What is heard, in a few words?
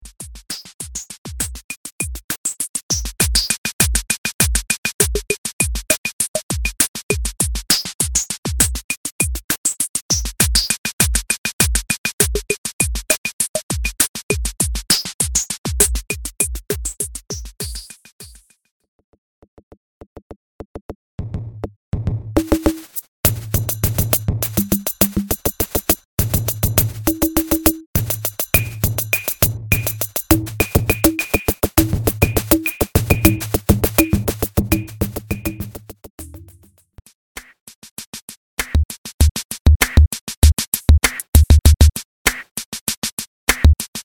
Percussion Chop; Builder Pack